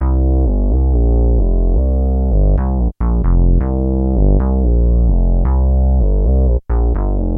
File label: Latin Bass in C